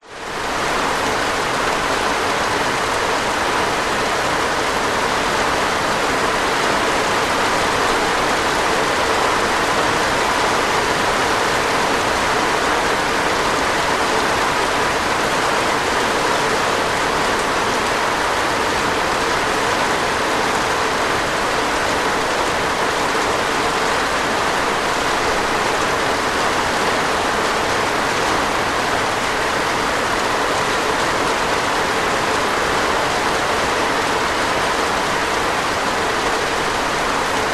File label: nature rain heavy roof internal open windows; zapsplat